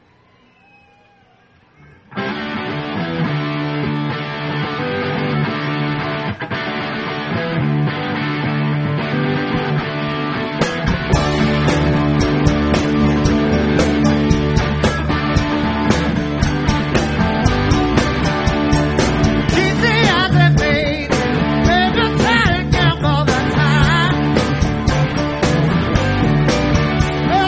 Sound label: jazz